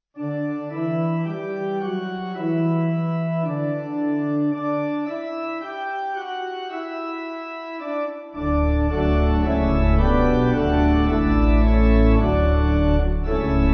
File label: Communion Credo Pipe; Communion Credo Willan